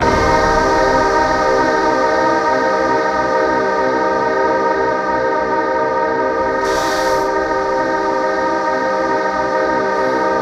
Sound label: C3, Synth Line; 90 s Sample CDs; NiceBeats; SynthLine VOL-11